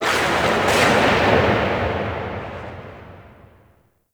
Ship Exterior Thunder Claps; Warframe; Corpus Ship Remaster

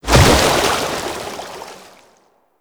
Tidal Wave End; Warframe; Pirate

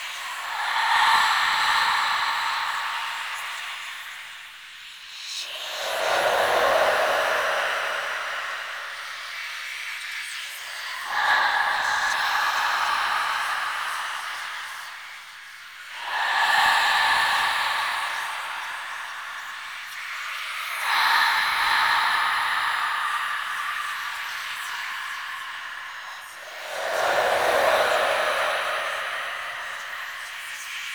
Feather Whisper Loop

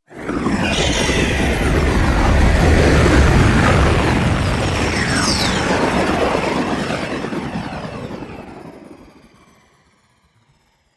Drop Off, Vehicles, Flight; Blue Sky Liset; Warframe

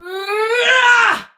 Attack Effort; Warframe; Tau; Dax; Ninja

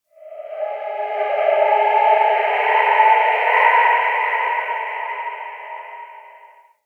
Spirit Cry; Ambience; Crypt; Champion Crypt

